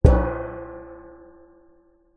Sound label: impact metal lid trash gong like; zapsplat